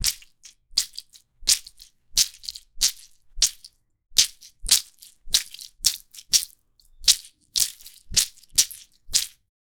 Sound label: wet thuds of squished obj, gore